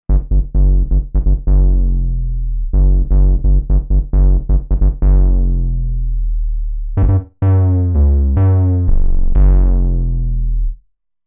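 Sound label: Hardstep, bass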